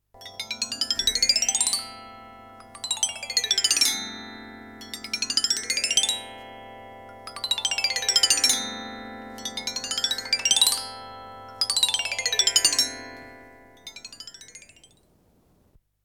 instrument vibraphone glissando effects with pedal; vibrafono glissato con pedale